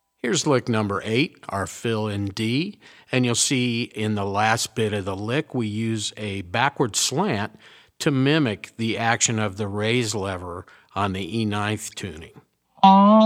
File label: resonator pedal, steel guitar